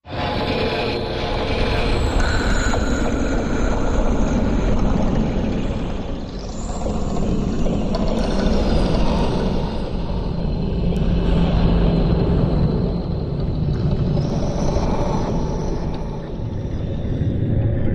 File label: Space Excavation Mach, sci-fi ambience